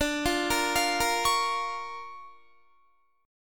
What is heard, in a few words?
D Minor Major 7th Sharp 5th, D, Guitar; DmM7#5; arpeggiated